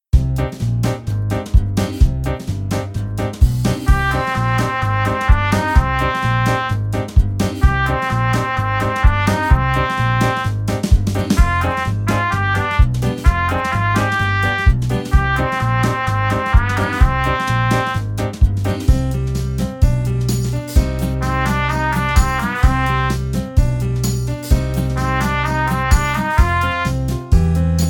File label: Trumpet; easy beat vol 1 trompete